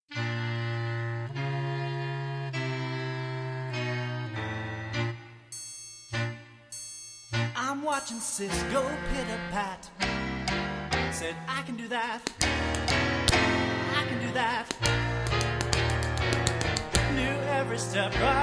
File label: chorus